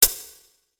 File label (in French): 1 seconde, réverbération acoustique